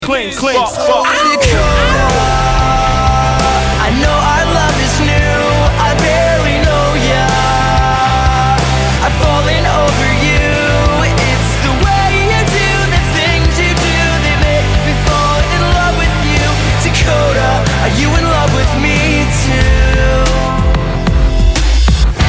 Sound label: acoustic